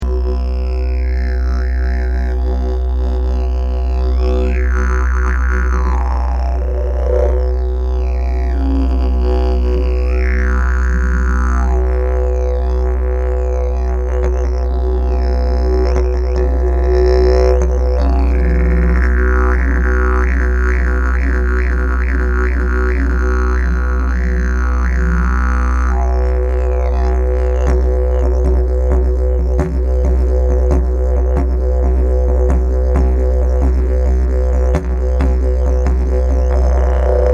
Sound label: C natural, didges